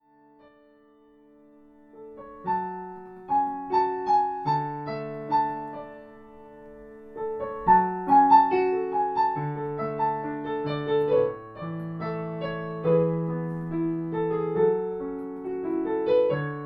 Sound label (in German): klavier